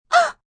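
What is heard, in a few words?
Gasp Surprised Female, Gasp, female, short, surprised (human voice); Gasping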